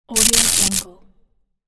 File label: electric zap